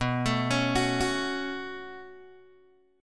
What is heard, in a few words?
B 7th Suspended 2nd, Guitar, Open G